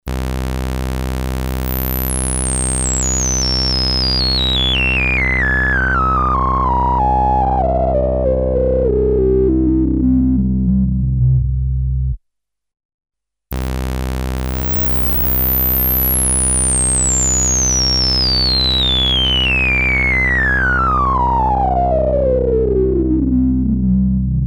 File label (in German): Poti AB Vergleich